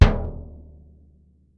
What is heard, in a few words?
BD F, Percussion; Miroslav Vitous - Percussion & Harp